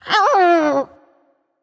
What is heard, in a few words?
growls